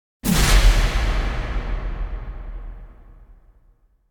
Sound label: Impact Heavy Blow Strike; Hollywood Edge; sound designer's toolkit v2